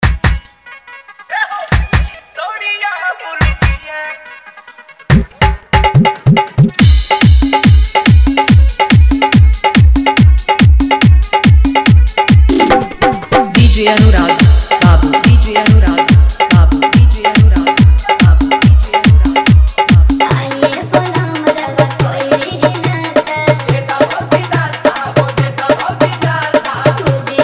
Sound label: Full Hard Dhollki Bass Jhankar Remix